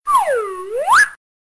Tiếng còi hoạt hình

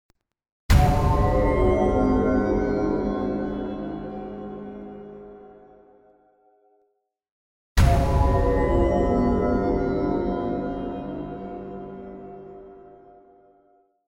Nhạc nền khoảnh khắc gặp kẻ xấu phim võ thuật

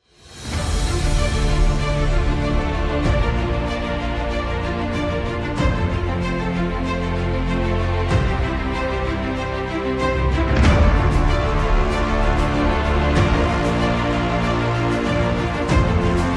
nhac khai mac nhac trailer phim gioi thieu MC

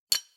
Tieng kinh gay thuy tinh be keu 1 tieng duy nhat

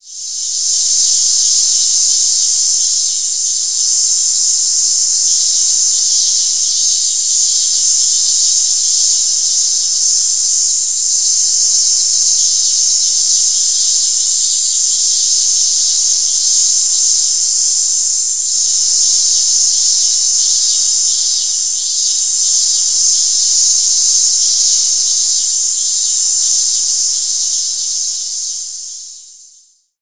Tieng ve sau keu

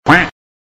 Tieng vit keu trong vat vo studio

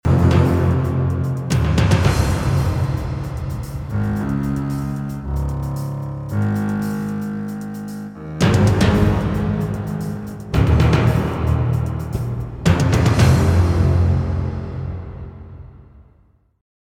Nhạc nền hồi hộp nghiêm trọng

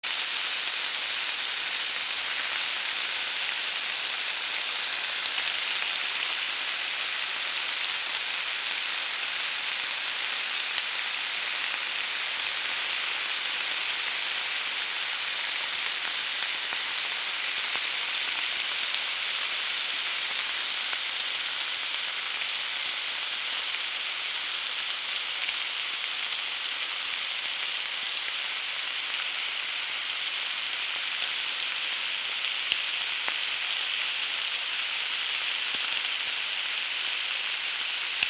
Tieng nau an trong bep

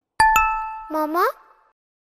Nhac chuong thong bao; momo

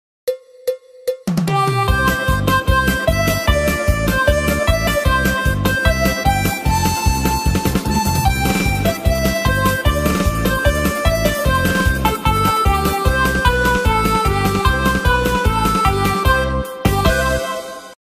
nhạc kết thúc chương trình mc chào organ ngắn

